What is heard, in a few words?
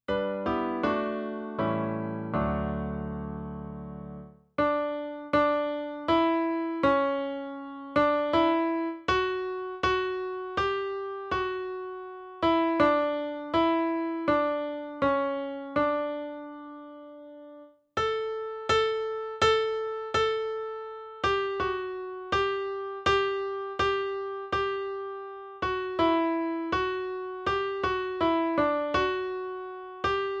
in D Major